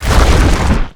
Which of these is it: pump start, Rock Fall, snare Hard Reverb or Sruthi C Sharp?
Rock Fall